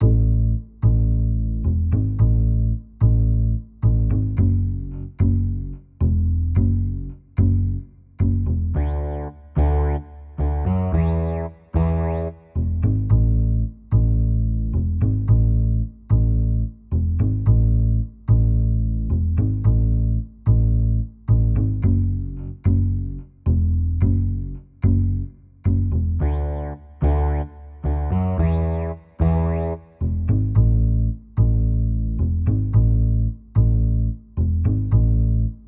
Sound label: Stand By Bass 110